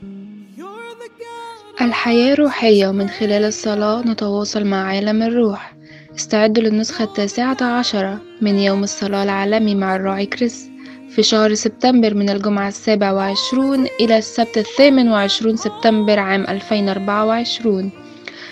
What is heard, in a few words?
ARABIC JINGLES